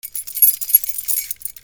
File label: CLICKS